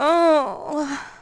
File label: die; urbanchaos